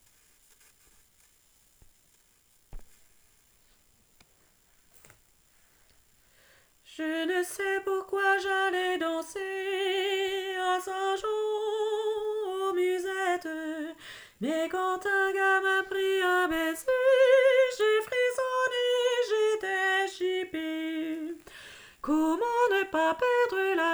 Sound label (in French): soprano